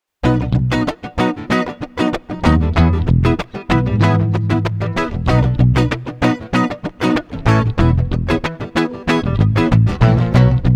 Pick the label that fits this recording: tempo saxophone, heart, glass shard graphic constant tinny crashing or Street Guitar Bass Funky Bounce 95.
Street Guitar Bass Funky Bounce 95